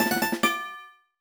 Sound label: Correct; the legend of zelda phantom hourglass gamerip; Question Three Correct